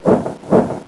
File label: Royal Griffin Move Sound; Heroes3; Griffin and Royal Griffin